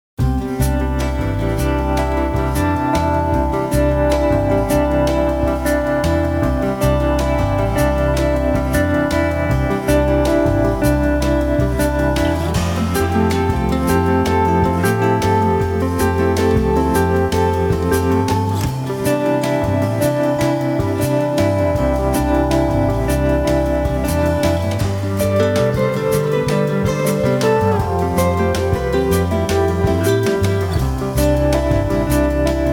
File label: Jamaican Rum Rhumba